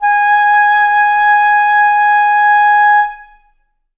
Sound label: flute acoustic